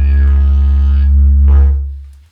Didgeridoo Kit Samples

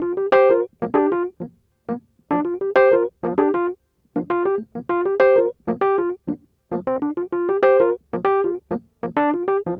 Smooth Groove Rhodes, 70s Analog Funk

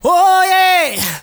MALE SOLO, Heart of Africa; Spectrasonics; Heart of Africa 2; S.MALEDRY

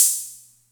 Music Percussion Computer HH open, Drum Machines

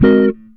GTR CHORD; Univers Sons; Jazzistic

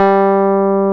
PIA, E-PIANOS MKS-20; Sound & Vision; Gigapack I CD 2

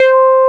SUP BASS; Syntec; Wall of Sounds

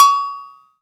AGO GO, GM DRUM SET; AKAI S-Series CD-ROM Sound Library VOL-8

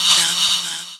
Fx Vox; Equipped Music; Loungin House

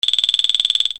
Percussion, EuroDance; Best Service; Ethnic Journey Sound Cube; EuroDance Essentials

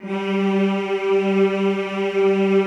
G2, Classical Choir; Best Service; Extended Classical Choir

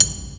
KLINK, INDUSTRIAL

